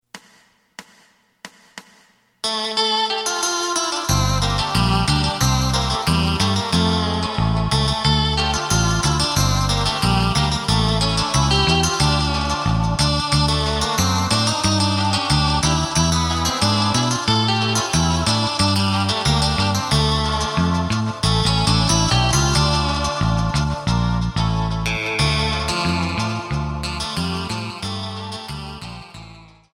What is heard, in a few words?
drum